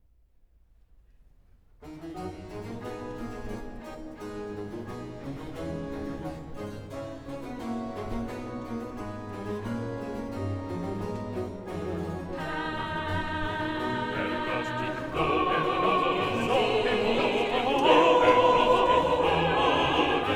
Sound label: Herr Gott dich loben wir (Chorus); Bach; Cantatas; BWV 16